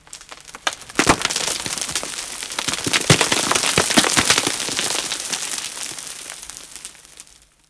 debris, ambience; ambient, ambience, rainscapes, rain; Left 4 Dead 2

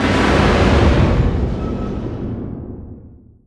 distance ships destroyer attacked; Hearts of Iron IV Death or Dishonor

